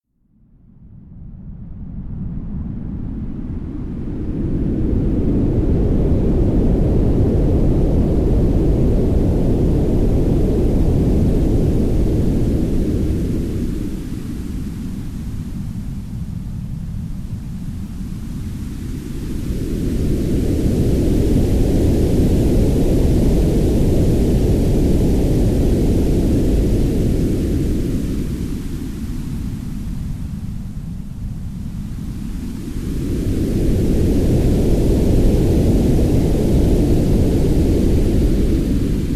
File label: Breaking Waves